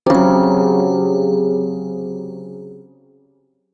Prime Shawzin Hirajoshi Chord D; Music; Warframe

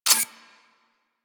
Harpoon Gun Low Ammo; Warframe; Sharkwing; Grineer

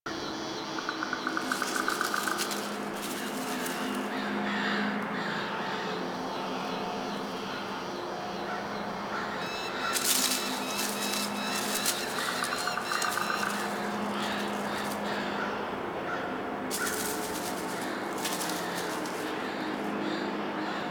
Exterior Foreground Field Sad Scared Loop; Ambience; Duviri; Warframe

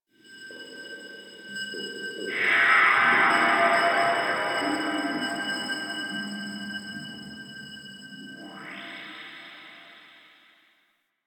Factory Texture, Ambience

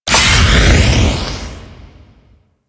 Jetpack Refuel; Warframe; DE; Vehicles; Veilbreaker

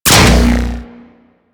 Bomb Puzzle Switch On; Missions; Raid; Grn Bomb Objective

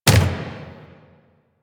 Turret Dry Fire; APC; Warframe; Lasrian

